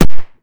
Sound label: blaster repeater; kenney fps; tactical shooter